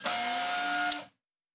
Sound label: servo small, sci-fi sounds, mechanical